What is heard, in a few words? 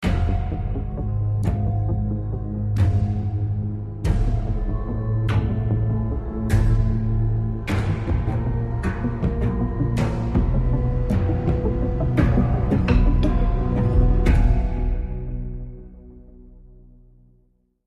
Suspense; the walking dead season one